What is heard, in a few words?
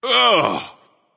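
nonverbal ugh; smite; voicepack; merlin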